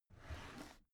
drawer cabinet carton open heavy halfway

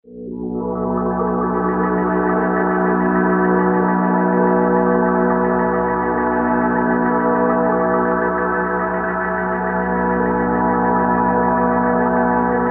musical layer

